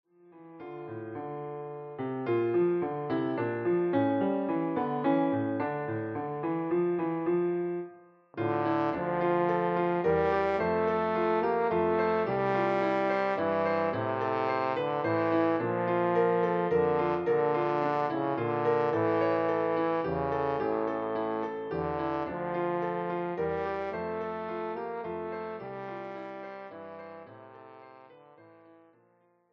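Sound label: Greensleeves, Horn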